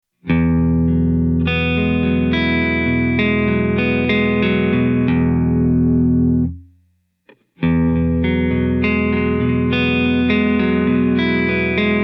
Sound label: Delay Blendable Tele Arpeggiated Chord